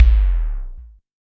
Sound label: Odd Kick Layer; Kick Snare Layers; Drum Bits; layering